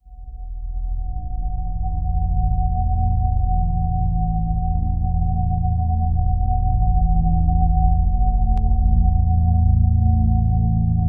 ambient sci-fi background, cold; SFX Engine

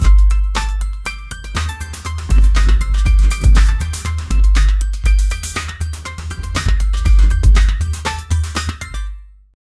upbeat jingle music

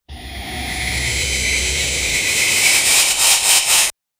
running goblin

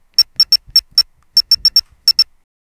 calculator beeps

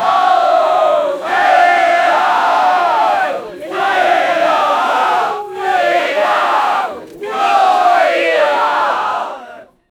a large group of people, protest

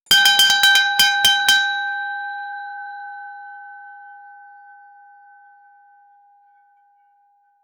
classic metallic boxing b, boxing

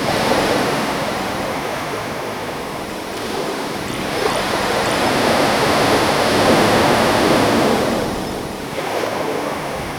a natural high quality so, waves

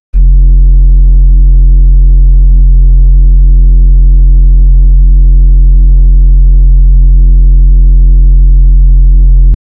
bass FM, synthesizer

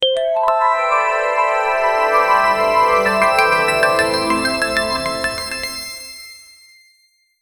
outro jingle

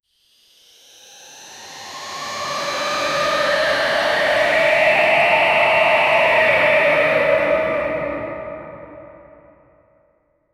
a creepy victory fanfar

